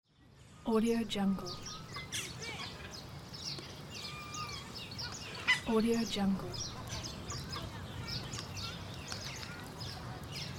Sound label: park ambience